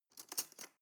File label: cutlery teaspoon drawer grab soft